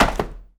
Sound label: Book Drop Wood; SFX Kit; Foley - Misc